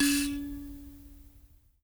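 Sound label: HI KALIMBA C#4; Heart of Africa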